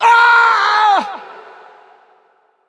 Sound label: Wound; Bolvar Fordragon